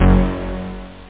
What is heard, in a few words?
rock'n'roll bass